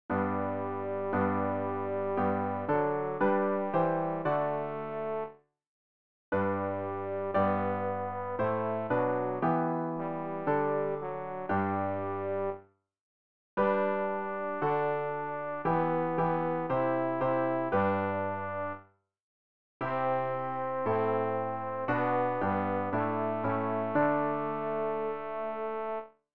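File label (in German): tenor, Übehilfen; Ref. Gesangbuch; RG 090: Danket Gott, denn er ist gut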